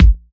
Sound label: bumpin kick drum A key; kick drum samples